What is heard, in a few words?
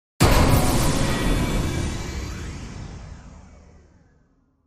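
Hit Whoosh Heavy Metal; hit to whoosh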